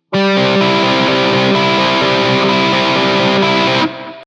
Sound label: no arpeggio